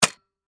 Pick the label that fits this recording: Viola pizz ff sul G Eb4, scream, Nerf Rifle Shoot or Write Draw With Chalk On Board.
Nerf Rifle Shoot